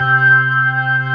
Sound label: B3 C 3-3, ORGAN